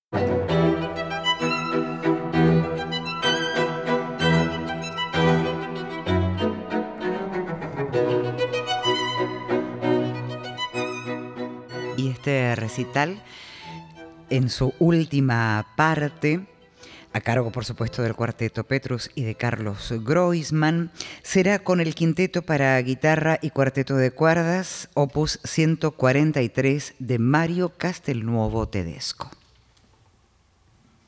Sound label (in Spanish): Quinteto para guitarra y cuarteto; Mario Castelnuovo-Tedesco; op.143; mov.I